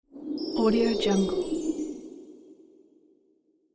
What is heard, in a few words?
christmas whoosh